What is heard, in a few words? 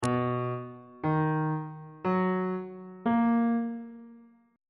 b ionian 7th chord 1 is b major 7th on bass clef